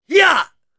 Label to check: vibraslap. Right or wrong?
Wrong